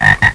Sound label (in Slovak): Zaba; Mesto a dvor; Revelation Sight and Sound SK